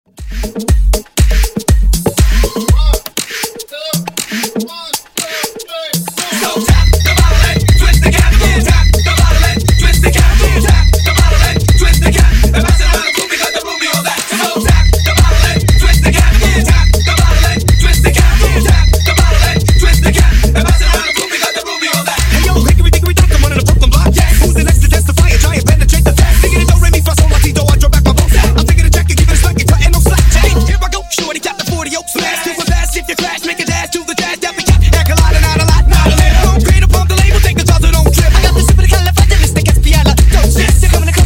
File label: Funk Nu Disco House, 120 Bpm